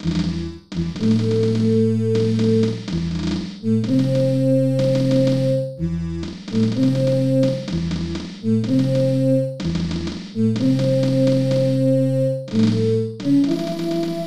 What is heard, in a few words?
Game Over ~ Taps; F-15 Super Strike Eagle; SNES